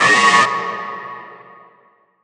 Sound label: Robot burp; SFX; 7rystan's 600+ FREE EDM SAMPLES & DRUMS